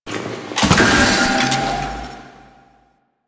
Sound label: Swarm Scanner Pickup; Warframe; Entrati